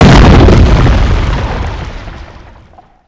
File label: small explosion; WAR